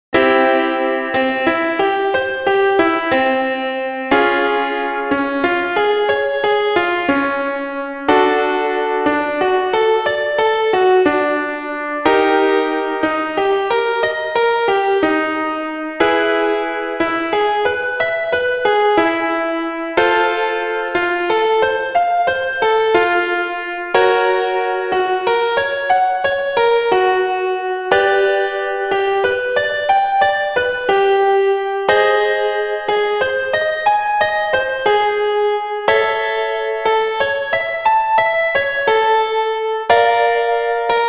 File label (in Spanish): arpegio mayor